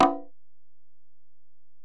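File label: Acoustic Percussion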